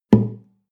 western guitar drum single

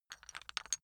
chess pieces rattle

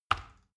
plastic button tiny push heavy

